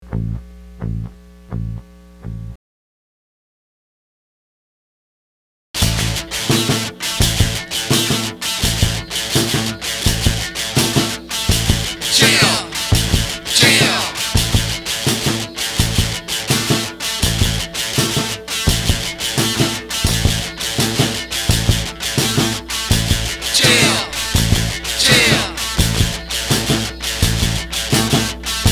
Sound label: novocal